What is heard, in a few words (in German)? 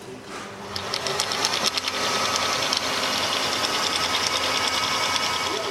eilgang frasmaschine